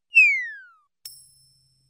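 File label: whistle of tossing and sound of a coin falling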